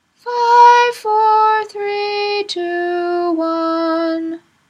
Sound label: weekday ahava rabba closing, nusach